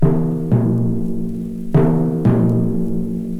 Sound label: Timpani